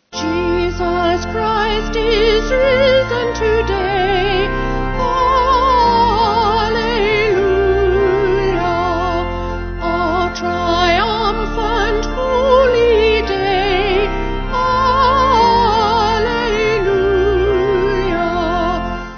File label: Vocal Organ; Jesus Christ Is Risen; Llanfair